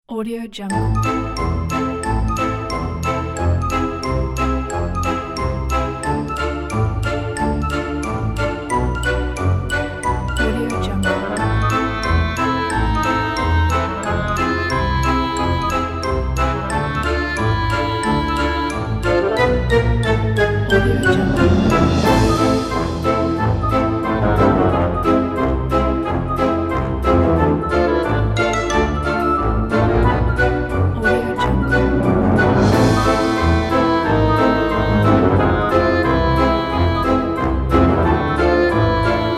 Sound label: strange comedy